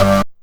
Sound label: Synth Hit G